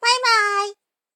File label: byebye